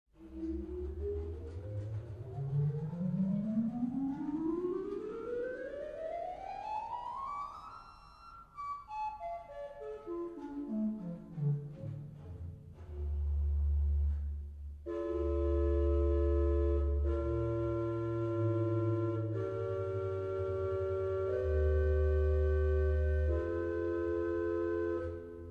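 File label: Gedackt 8; Schultheis Schlimbach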